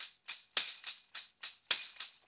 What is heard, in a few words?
Brass Tamb, Perc Loops; Drum Tools